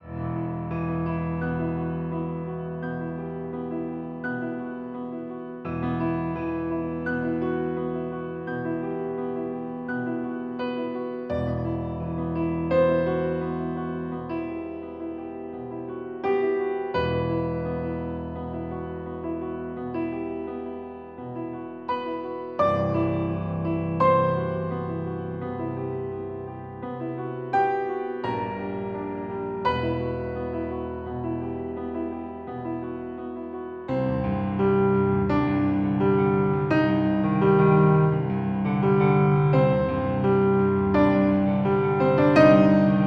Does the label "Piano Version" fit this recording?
Yes